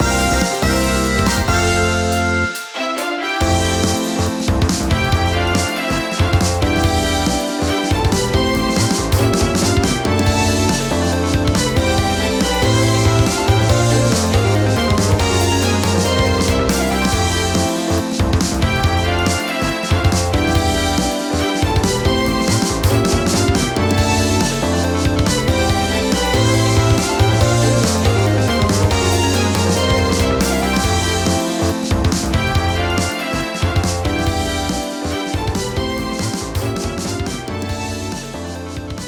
BGM Win; Taiko no Tatsujin: Rhythmic Adventure Pack; Tokoton RPG Pack

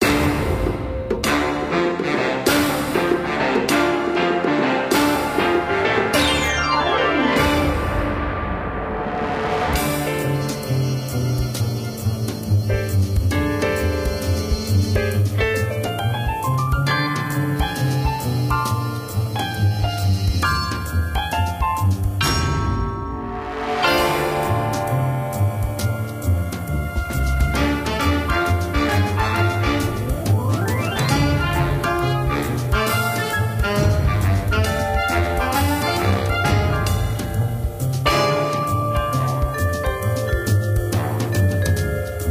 Glockenspiel; Two Dollar Glockenspiel

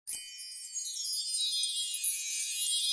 Ball Shine

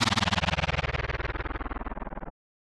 Warp

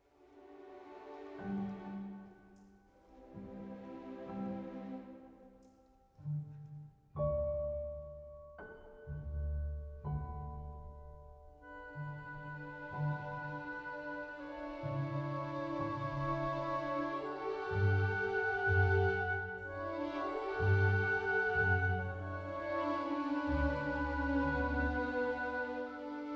World Music

